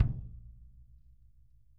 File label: KICK, Drum Kits